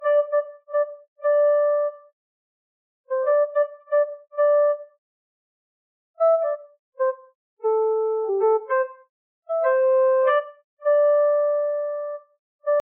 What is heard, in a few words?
marimba; music restoration